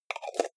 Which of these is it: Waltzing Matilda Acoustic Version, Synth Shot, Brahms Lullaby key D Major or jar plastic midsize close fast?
jar plastic midsize close fast